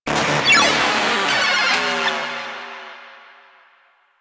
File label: Arcade Fail; Warframe; Nineteeen Ninety Nine; Ninety Nine Snake Game